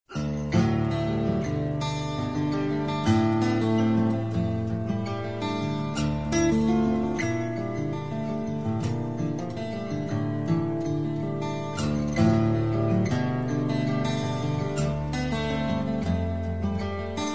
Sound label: acoustic